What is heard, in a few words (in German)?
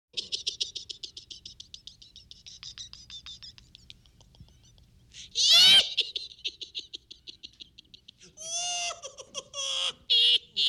Lachen Hexengelaechter